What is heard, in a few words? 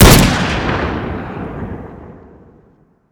deagle, weapons; garrysmod; deagle cstm